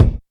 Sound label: rich lows kick drum sample a key; kick drum samples